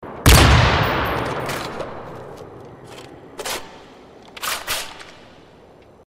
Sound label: BARRETT 50 CAL